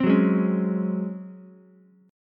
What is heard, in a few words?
C sharp Major 7th Suspended 4th C sharp Ukulele; strummed; chord; JGuitar